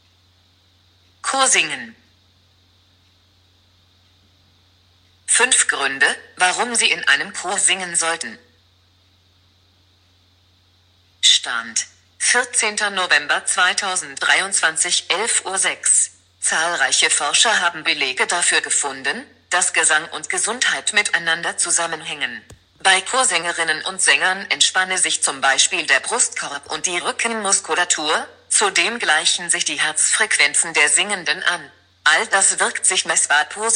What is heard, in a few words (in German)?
Chorsingen